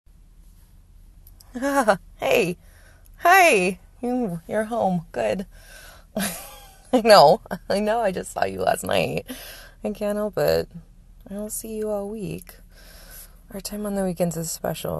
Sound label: [F4M] [Tickly][Melty][Giggly][Sounds] from a [Submissive Female]; Brat Training; Bratty; Slapped